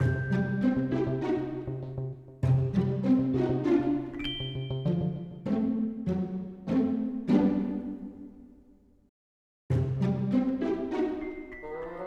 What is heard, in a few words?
Quest Melody Loop